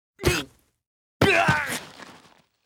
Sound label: die; BELMALE